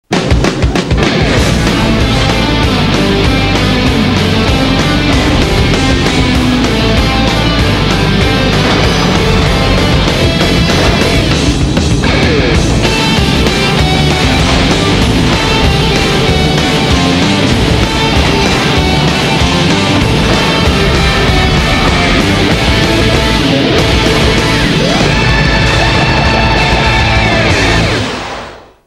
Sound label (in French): musique generique fin